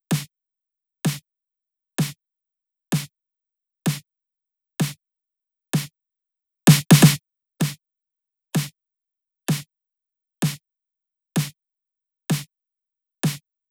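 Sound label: Loop 128BPM snare; VEC5 Drumloops; VEC5 Multi Loops 128BPM; Loop Elements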